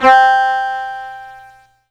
Yamaha PSS-140 Human Voice 1 C4

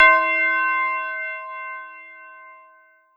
Roland JV-2080 Tubular Bell C5

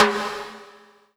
Kawai K11 High Timbale

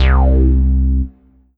Korg N1R Fat Rez Bass C2

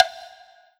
Roland SC-88 Wood Block

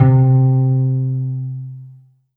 Yamaha TG500 FI Harp C3

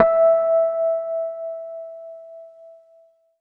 Korg 01W Harmonics E5

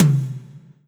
Roland SC-88 High Tom

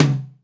Tight Low Tom

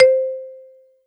Casio CTK-611 Marimba C5

